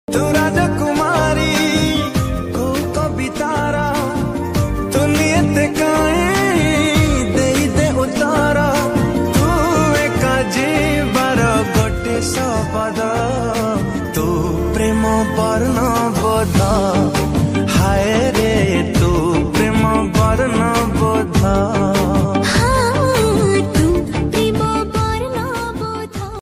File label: odia love romantic ringtone